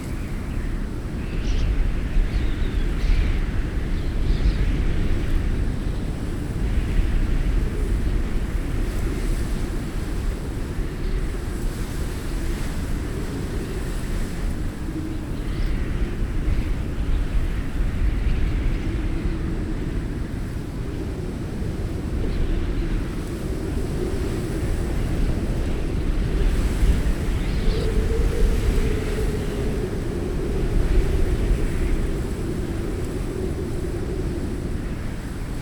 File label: Blizzard strong loop